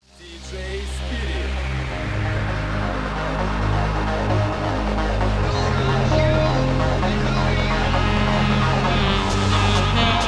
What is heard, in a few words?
Sax